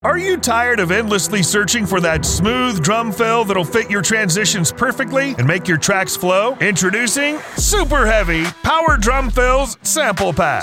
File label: HEAVY Power Drum Fills; SUPER HEAVY; Oversampled